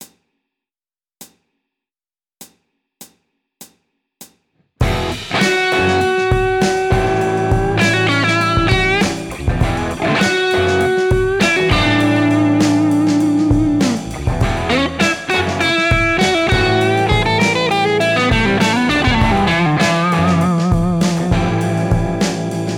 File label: Blues, Guitar Techniques; Guitar Techniques 2019 Issue 290 January